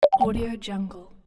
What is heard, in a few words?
futuristic confirmation